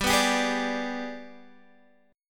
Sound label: Ab Minor Major 7th Flat 5th, G